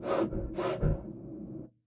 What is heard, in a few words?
peek sniff, antlion guard; hl2